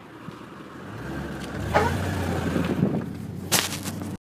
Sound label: big power loss chirp squeal noise; w211 amg